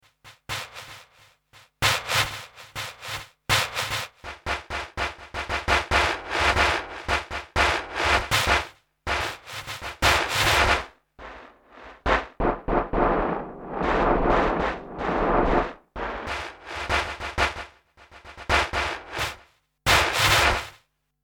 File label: snare splashes